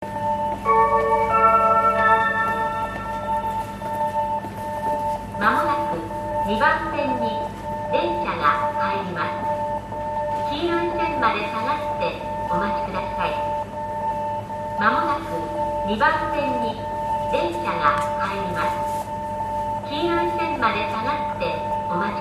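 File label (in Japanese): yamagata sekkin, 接近放送; １番のりば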